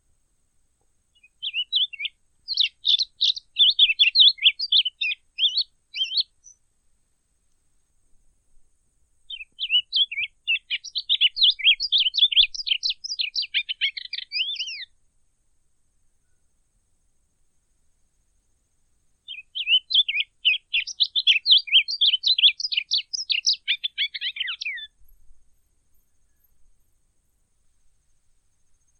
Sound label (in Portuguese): Canto de Coleiro do Brejo